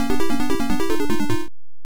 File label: Miss; milon s secret castle nes